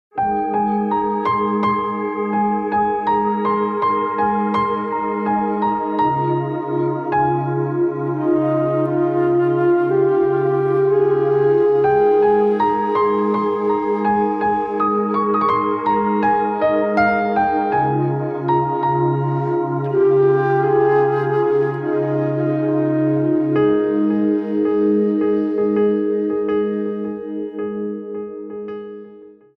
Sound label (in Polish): instrumental; karaoke; Krakowskie Centrum Artystyczne